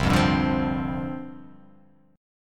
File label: C Suspended 2nd Suspended 4th C; Csus2sus4 strummed